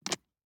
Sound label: DICE on Neoprene, Grab, Standard, 4 Four Dice; Samples; ArticulatedSounds; DICE Sfx Party (Extended)